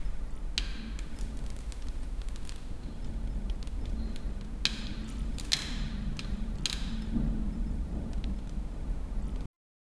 quiet blacksmith forge; workshop

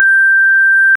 single tone aesthetic

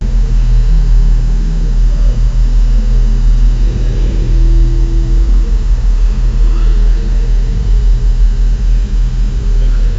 sudden silence builds tension

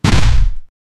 thunder bolt charge zap

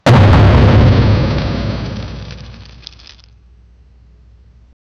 the ricochet of a tank, armor

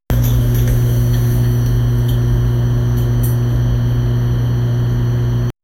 a faint kitchen hum, background ambience

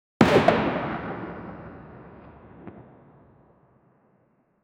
jumping, athletic; jumping sfx

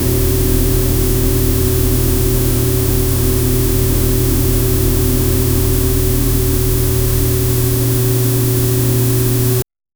low analog tape hiss with

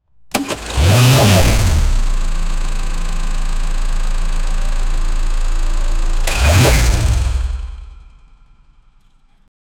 sports car engine hitting, redline

